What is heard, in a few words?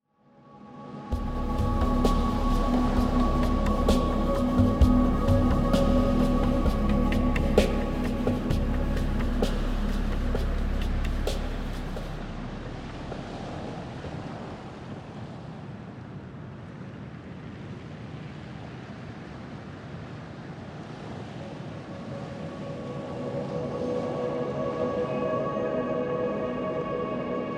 Deep Sleep Relax Music